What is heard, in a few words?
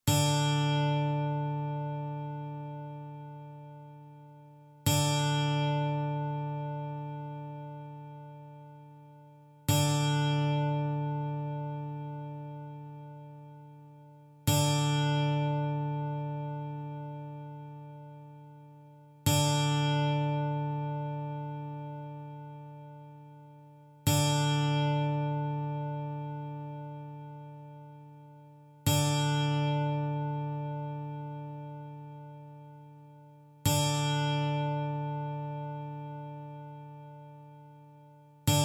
Tuning D Guitar Sound